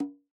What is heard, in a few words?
Conga H Open; Yamaha DJX-2B